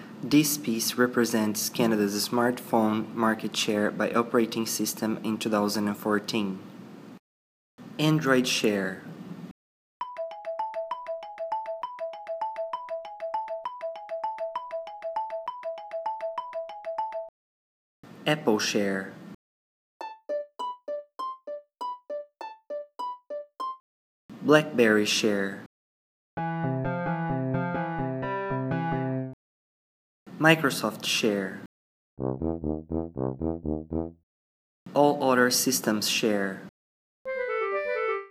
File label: Sonification